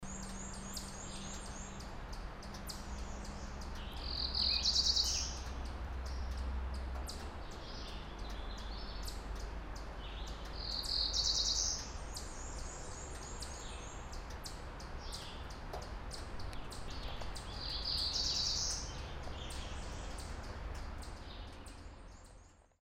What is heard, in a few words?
Cerulean Warbler; Garrett MD